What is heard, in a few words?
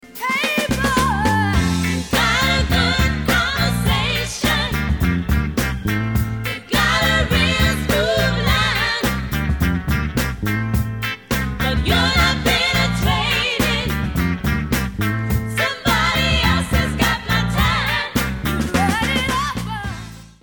blind guitar